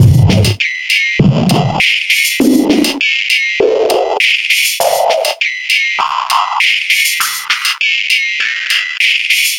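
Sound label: Sickly Loop, beats; Sonic Foundry (Sony Creative Software); Esoterik Beatz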